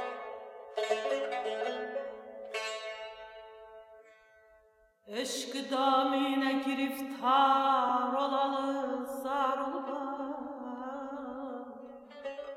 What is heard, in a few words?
mahur hindi; leyli